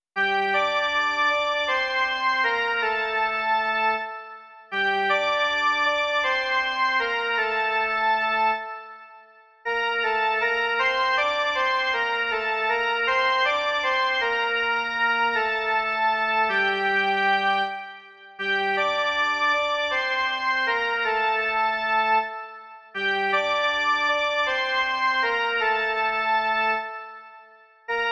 jen melodie; Pán Bůh má síla jest

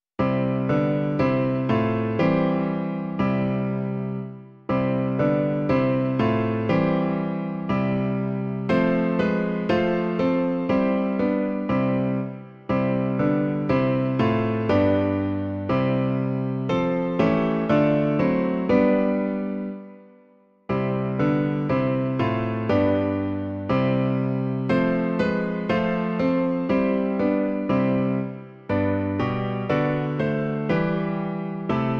klavír; Klavírní verze

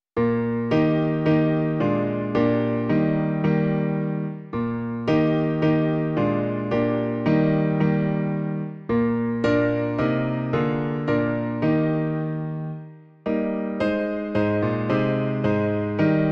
klavír; Jak rozkošné a milé; Klavírní verze

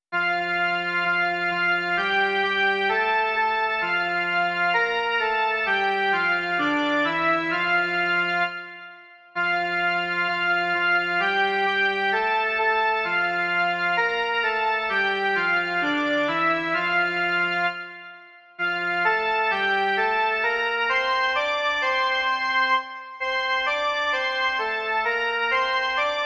jen melodie; Ó Bože, jenž jsi v nebesích

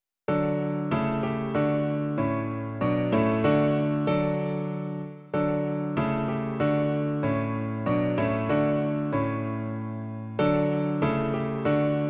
klavír; Klavírní verze

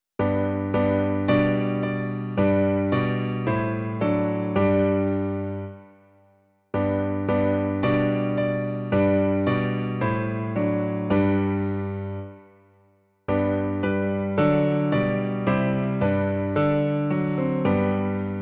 Kriste, jenž jsi světlo - klavír; Klavírní verze

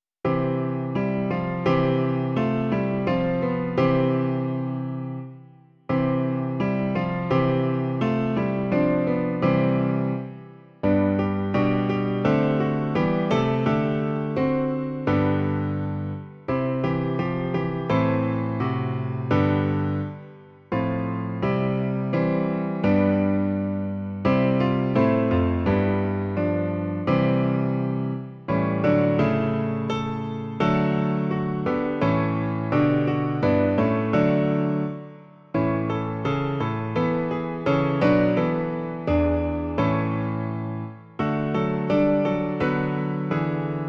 klavír; Ejhle, Hospodin přijde; Klavírní verze

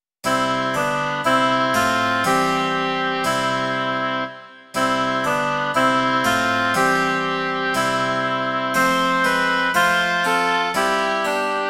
2 hoboje a cembalo; Imaginativní verze

